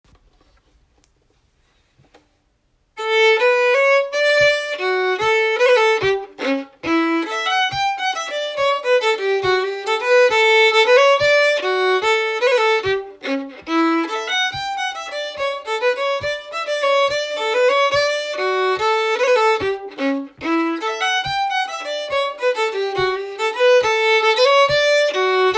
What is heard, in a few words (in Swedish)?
Schottis; efter Plog-Anders